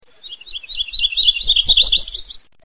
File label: Sweeta-sweeta-sweeta-sweeta-sweetaleetaleet, not buzzy, usually rises in pitch